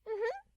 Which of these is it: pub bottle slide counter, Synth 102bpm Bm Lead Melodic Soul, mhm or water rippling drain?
mhm